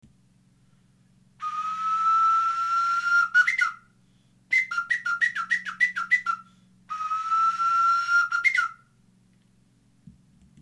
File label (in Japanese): uguisu; リサイクル楽器